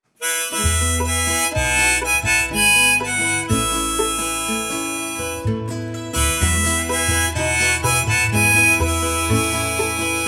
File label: Buskers Lullaby